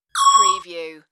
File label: Zinger